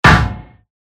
盾 金属; モノラル

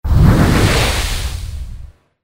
風魔法 小; モノラル; 魔法効果音

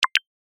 メッセージ

